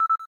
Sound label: cam timer last